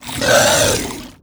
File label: swarm generic idle; Stellaris; other entities